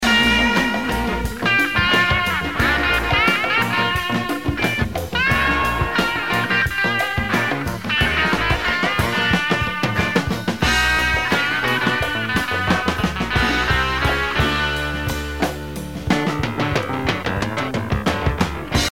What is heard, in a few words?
Freeway Blues